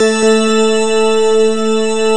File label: AMBI PAD